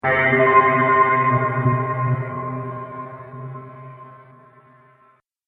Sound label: Cyber Darkness Scifi Fx; Science Fiction Sound Effect; Sci Fi Sound Effects